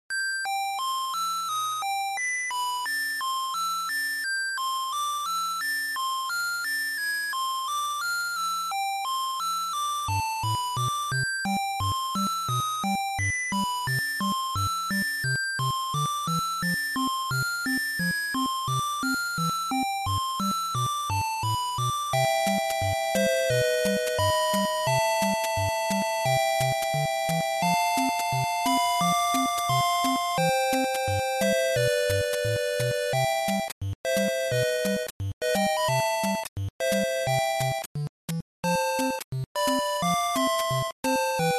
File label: ending